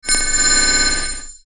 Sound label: high pitch computer alert tone